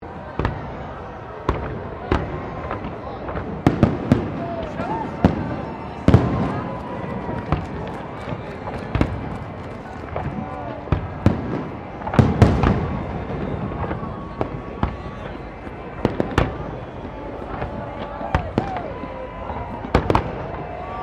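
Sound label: Ambience New Year's Eve Melbourne Crowd Fireworks; New Year's Eve 2008 Fireworks; Firework Sound Effects; Foundation1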